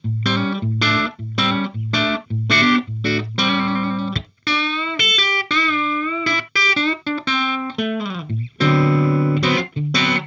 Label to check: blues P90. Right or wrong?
Right